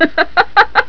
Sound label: LAUGHING; VOICES